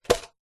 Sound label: food noodle pot dried plastic set down; zapsplat